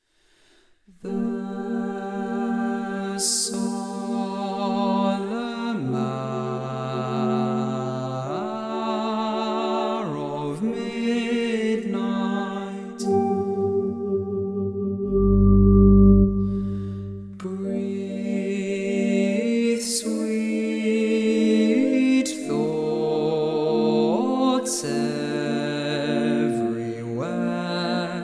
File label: Tenor